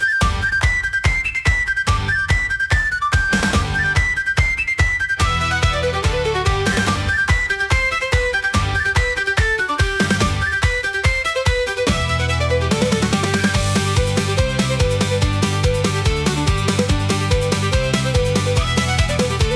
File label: Barley And Fiddle, irish pub rock